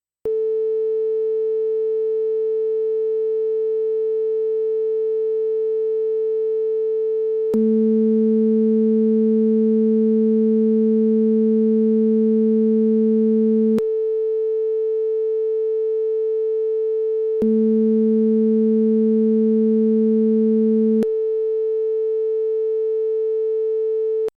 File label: Quadrature Summing Filter Two Tone Stereo